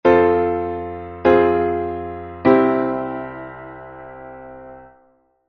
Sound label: IV→IVm→I